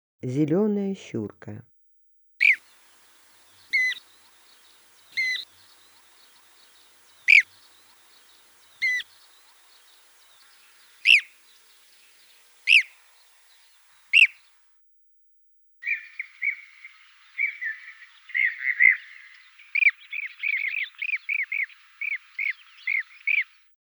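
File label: blue-cheeked bee-eater voice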